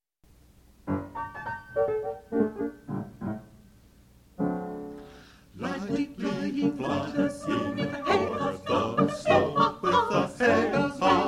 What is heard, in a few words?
The Sleigh Bass, Choir